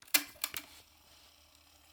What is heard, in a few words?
Answering Press Rewind